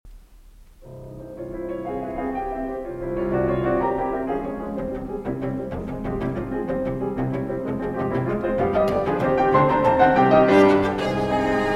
Cello Sonata No. 2 in F Major, Allegro Passionato, Live; Brahms; Op. 99 III.